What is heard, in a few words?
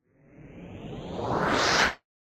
Laser Charge Up; SoundsCrate